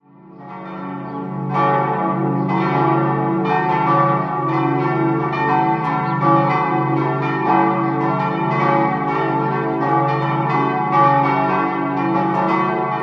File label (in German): Hahnbach, Pfarrkirche, Glocken; St. Jakobus